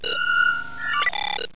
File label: beam energize; federation